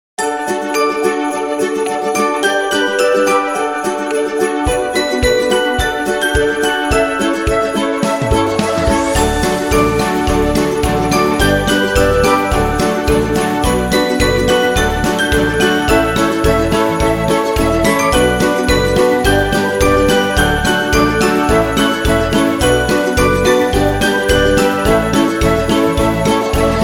Christmas Song

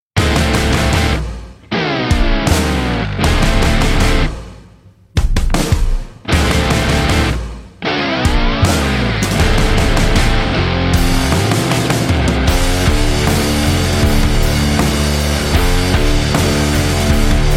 Blues Rock Intro; blues intros

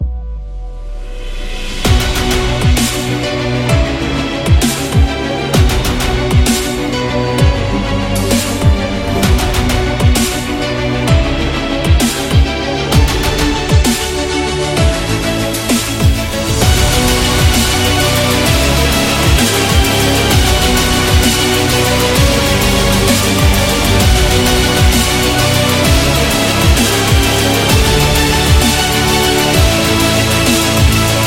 Epic Dramatic

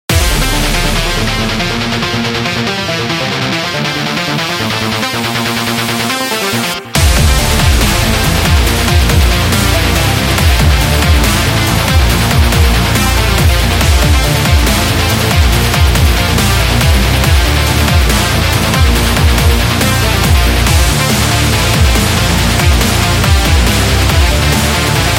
Energetic Sport, metal

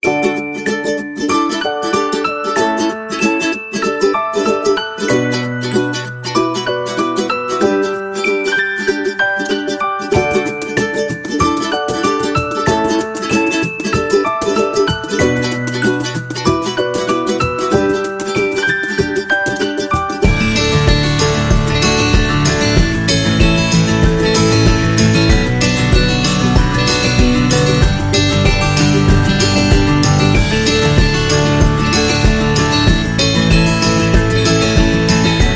Kid, happy

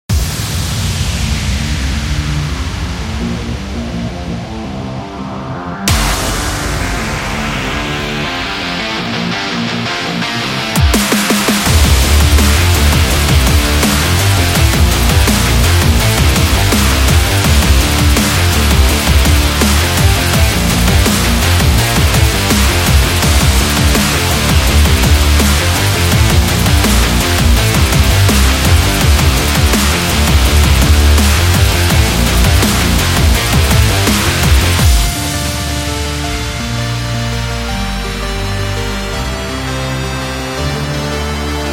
Rock and Dubstep; sports training energy

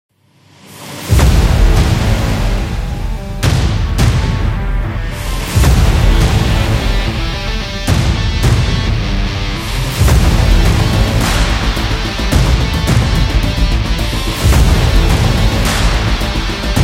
Stadium Big Beat Trailer Rock

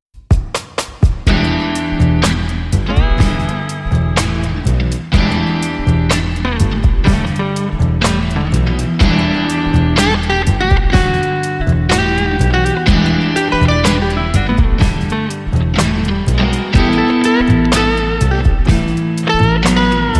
Logo Promo Blues; blues intros

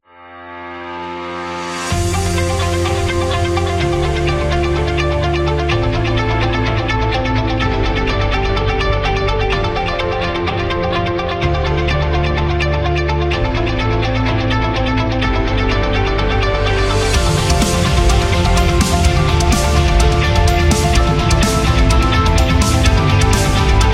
Epic Promo

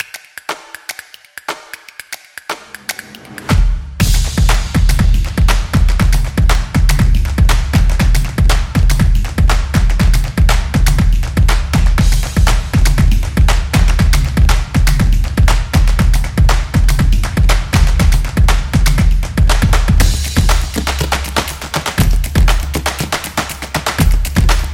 Percussion Hit

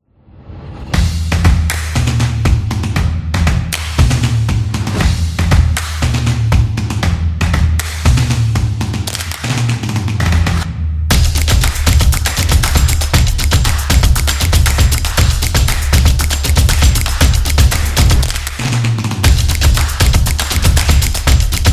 Drum Intro

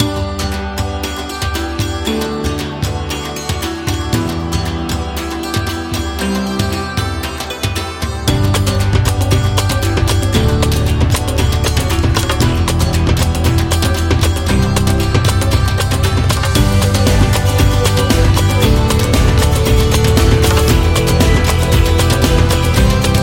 India Background

